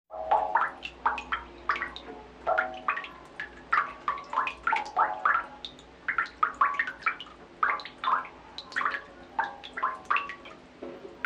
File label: Drips In Metal Barrel